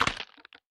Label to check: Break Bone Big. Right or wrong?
Right